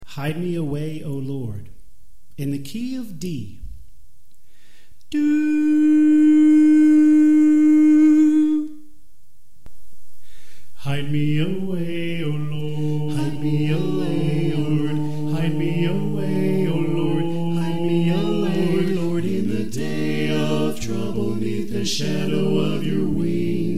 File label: Tenor Left